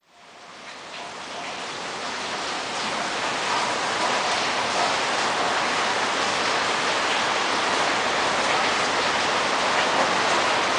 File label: thunderstorm